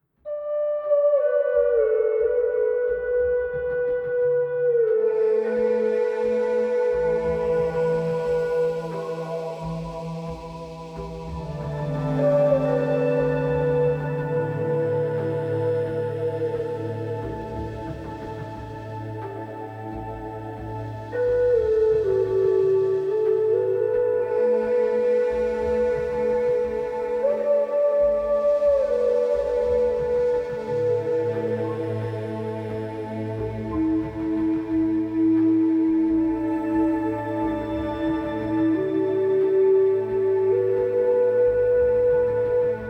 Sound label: Matching Sleep Tones & Sleep Cycles; Mayan Moon Music for Sleep